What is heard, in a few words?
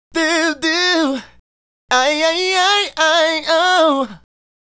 Male Solo, Hip-Hop and RnB Vocals